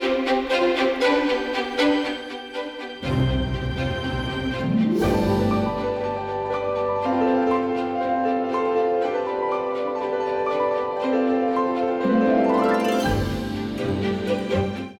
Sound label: Music